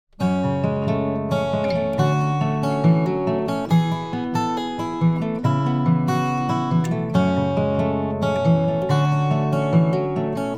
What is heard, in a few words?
guitar AG finger